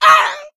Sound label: hunter pain short; player; voice; source engine; left 4 dead 2